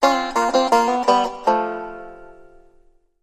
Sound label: Banjo Lick